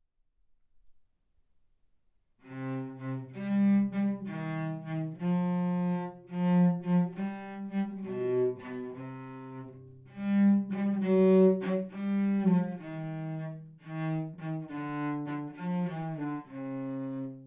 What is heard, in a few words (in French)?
Remontant, pour violoncelle solo, Extrait sonore (en répétition); Durée : env 1min40; Remontant pour une malade; Création : Christine Mayencourt, 2025